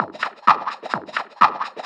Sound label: FX Loop 128 BPM, House